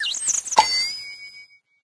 baseball question; sound schemes

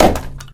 wood box impact bullet, physics

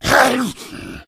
hunter stunned; player; voice; pain; source engine; left 4 dead 2

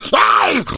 hunter shred, attack voice

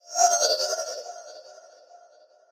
robot synth; Source Engine; Portal 2; robot parts

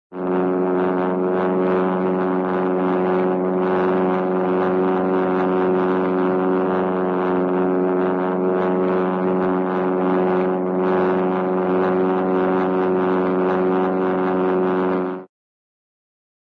airplane, prop fokker in flight; cartoon

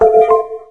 inside your computer question; windows 9x; sound schemes

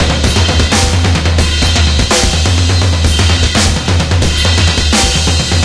drums; Left 4 Dead 2; Source Engine; video games; zombat; horde

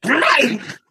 ballbot yikes

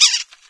single bird; ambient; random animals; Left 4 Dead 2; Source Engine

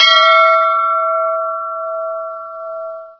brass bell e; ambient; Source Engine; cstrike

